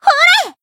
Voice attack, jp; alma

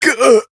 damaged

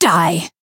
Voice attack; suzette

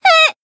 damaged; nona

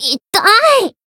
damaged jp

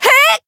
Voice attack; komachi

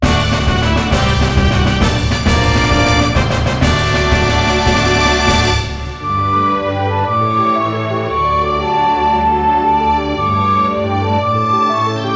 fanfare; shouri natsuno yobigoe

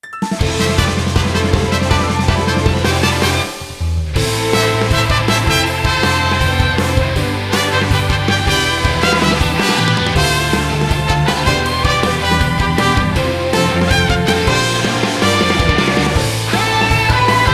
battle; foreign history

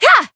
Voice attack; isuka

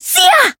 attack

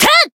attack, jp

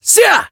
attack

